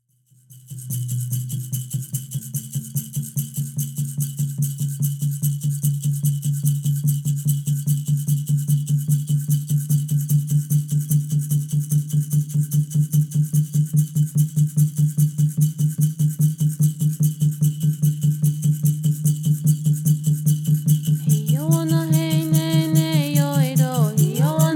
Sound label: Peyote Song